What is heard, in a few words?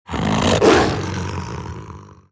wolf lord attack